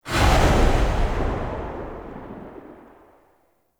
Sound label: Pod Shotgun Tail; Warframe; Weapons; Tenno